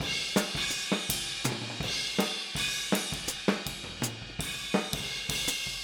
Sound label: Boogaloo Fill Hi-Hat, 82 BPM, Drums; Sonic Foundry (Sony Creative Software) - Drums from the Big Room The Mixes